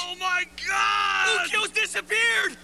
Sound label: Exclamation; ranma - grimlock